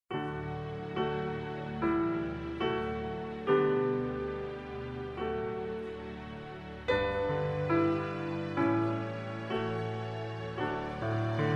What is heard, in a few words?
Instrumental Praise